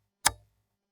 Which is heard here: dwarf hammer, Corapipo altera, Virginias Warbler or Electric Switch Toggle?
Electric Switch Toggle